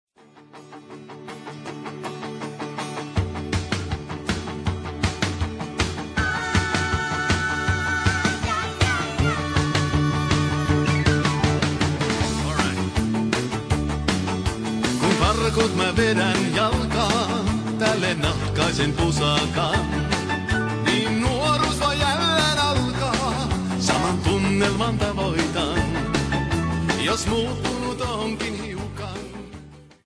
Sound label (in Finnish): finnish pop